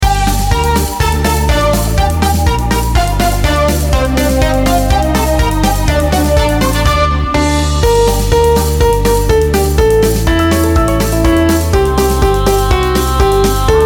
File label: karaoke